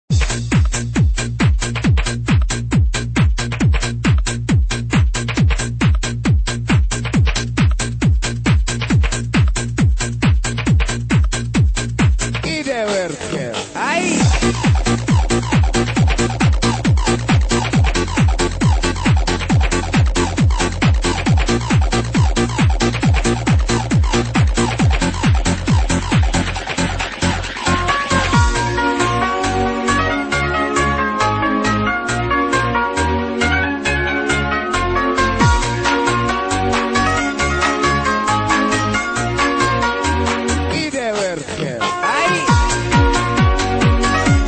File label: hi-fi instrumental